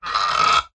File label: industrial lever metal large heavy short fast movement creak; zapsplat